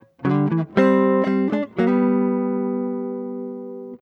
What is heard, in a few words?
jazz